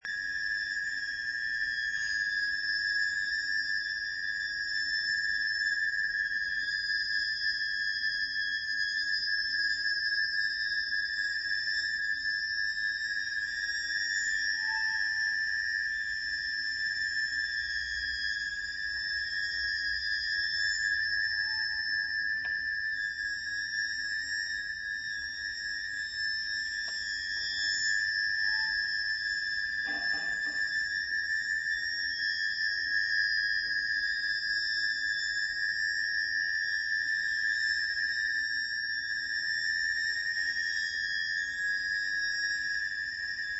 Overtone Ensemble

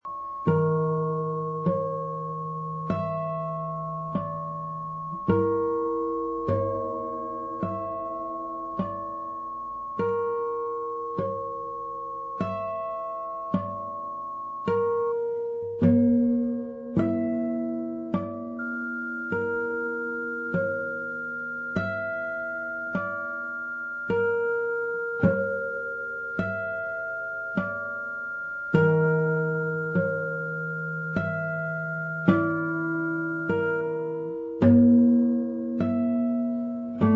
Chords

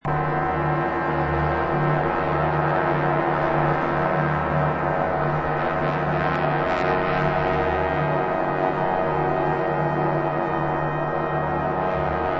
Descending

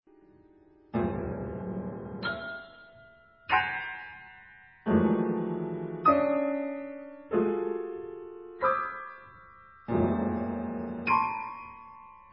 Piano Music; hungaroton

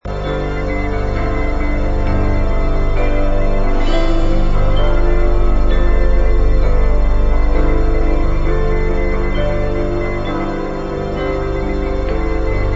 Calm

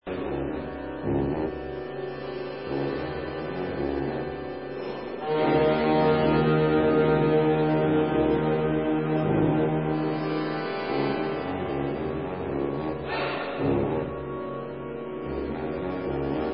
sax spiral

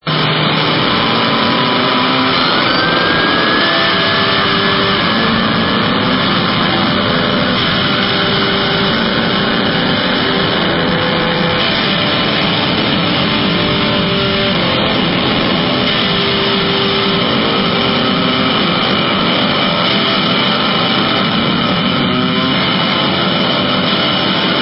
Green Door Open

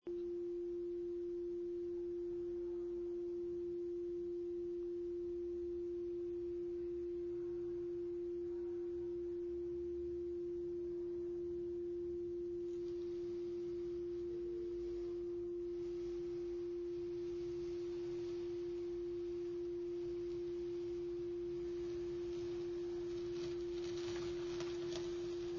Two Strings

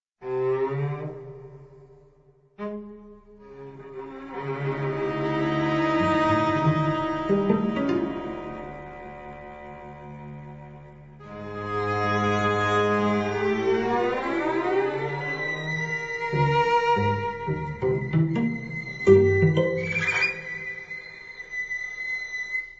String

